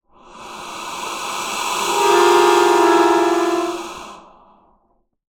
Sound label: Wind, Melodica